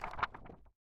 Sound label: turn Page; real3d flipbook